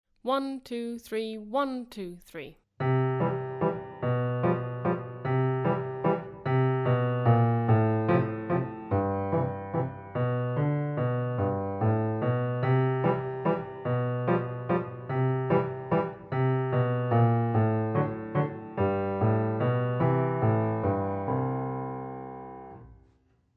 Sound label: Piano Waltz. Playalong